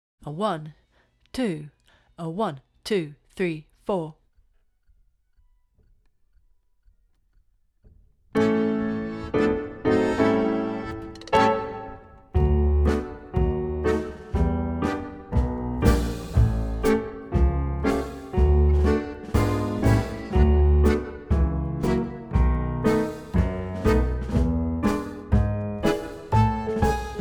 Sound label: Bourbon Street Stomp backing